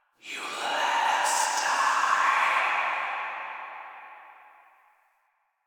Cave Whispers; Orokin; Warframe; Ambience; Stalker

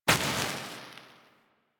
Enemy Burn Death; Warframe; Caliber Chicks minigame; weapons; hit effects

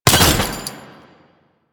Ammo Bounce; DE; Warframe; Warframes; Frumentarius; AmmoAbility

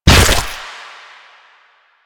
Infested Ancient Footstep; Enemies; Proto Infested Ancient; Warframe; Ancient Ninety Nine

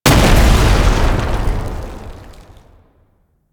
Tank Infested Swipe Impact; Enemies; Abilities; Warframe; Lasrian

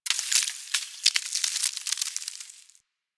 Landscape Ice Slide Small, Ambience; Warframe; Venus Open World; One Offs

